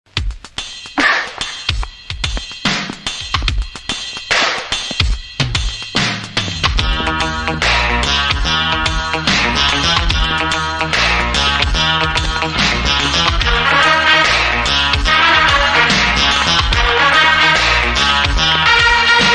mass bgm mass bgm instrumental ringtone; rajini mass bgm

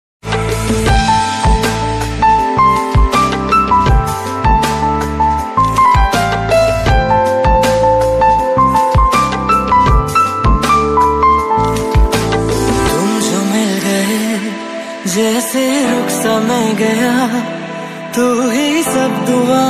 instrumental ringtone instrumental music ringtone; ruposh movie

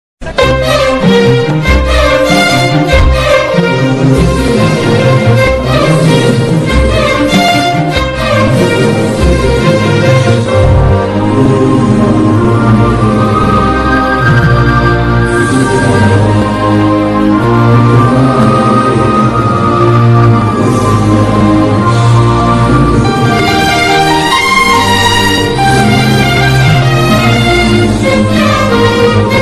movie love bgm

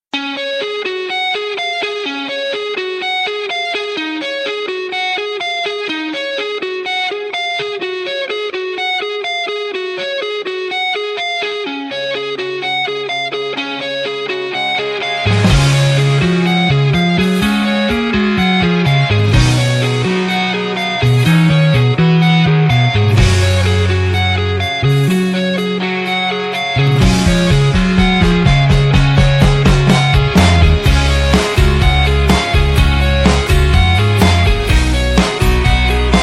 instrumental

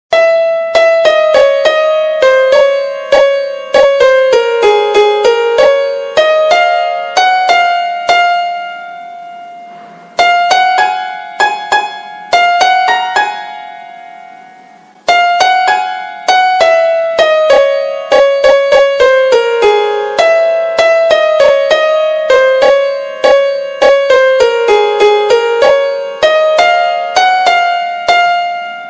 army song ringtone army ringtone dj remix; indian army; army call

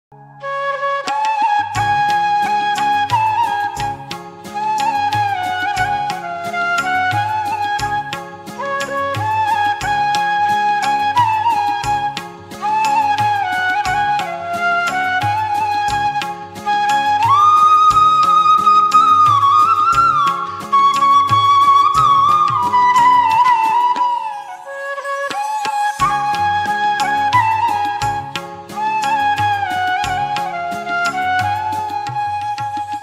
song flute mobile ringtone

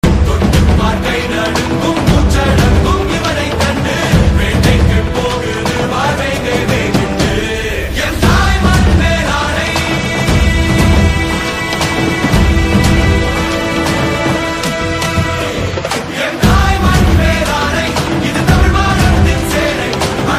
song, tamil

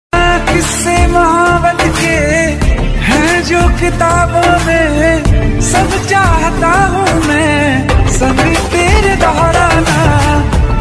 ringtone instrumental

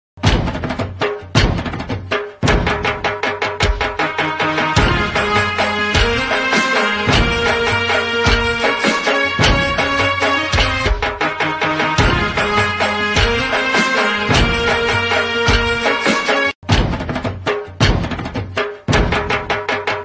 Garba Tune Navratri BGM; navratri special garba bgm

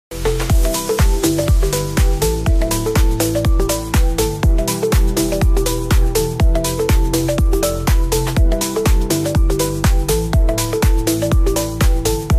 sad ringtone

